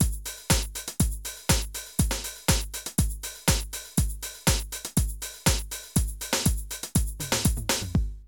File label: drum loops, House & Garage